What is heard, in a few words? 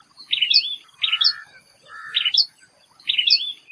Ashy Tailorbird call